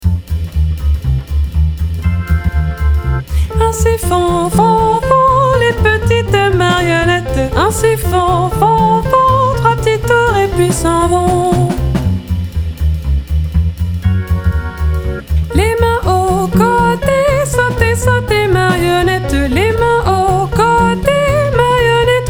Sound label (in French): ainsi font font font chant, Échauffement vocal pour les chœurs et les classes (6-12ans)